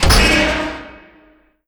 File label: noise hazard wheel; world misc